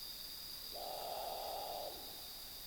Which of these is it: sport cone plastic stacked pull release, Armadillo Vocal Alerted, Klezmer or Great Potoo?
Great Potoo